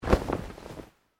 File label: Clothing Shirt Cotton Move